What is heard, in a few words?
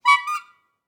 Bulb horn honking once